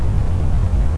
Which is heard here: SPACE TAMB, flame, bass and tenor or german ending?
flame